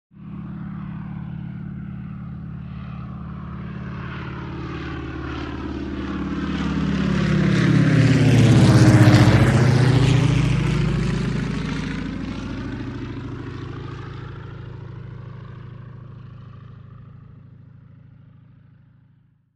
Deep, Growling Engine, Medium-speed By At High-medium Altitude. Medium To Distant Perspective. Prop Plane.; broussard